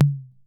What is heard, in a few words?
TOM L; Crypton - DrumBase5000; KR55B